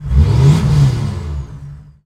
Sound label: Humvee Rev; Trucks